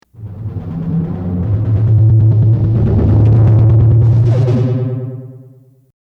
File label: Drum